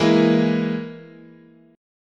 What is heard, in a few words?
E Major Flat 5th, chord